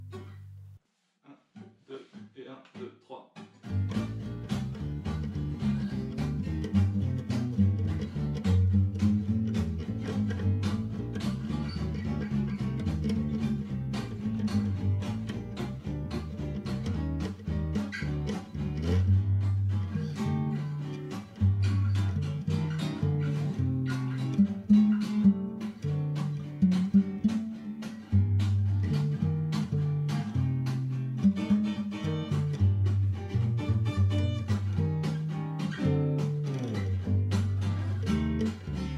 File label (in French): basse; LUTHERIE; Ma premiere basse; modele v1